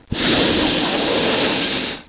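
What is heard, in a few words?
snake sound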